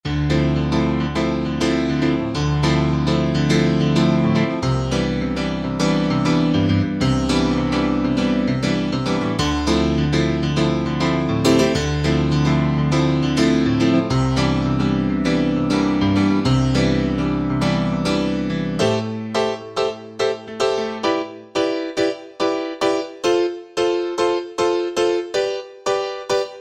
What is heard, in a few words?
JD Piano, Roland V-Synth; Factory Patches